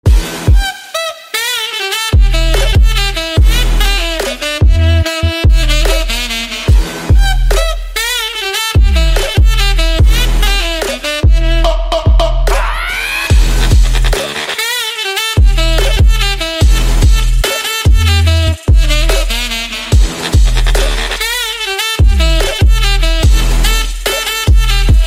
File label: trap